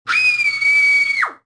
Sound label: tap; heck house